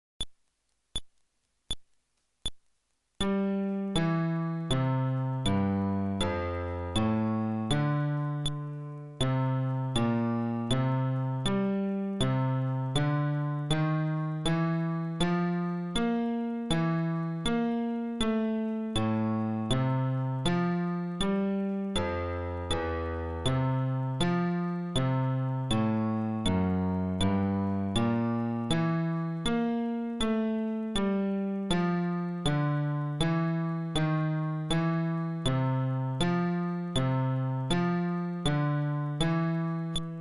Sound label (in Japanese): フレットレス (テンポ80)